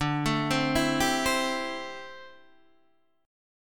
D 7th Suspended 2nd Suspended 4th D, Guitar Open D